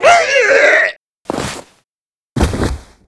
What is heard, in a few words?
Yeti Die